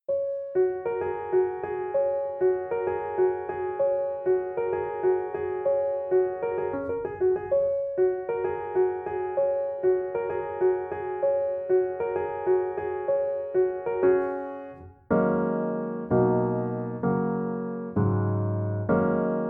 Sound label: kaikei piano